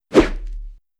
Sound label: Sword Whoosh; Weapons & Explosions; Sony Pictures Sound Effects Series Volumes 1-10; Sony Creative Software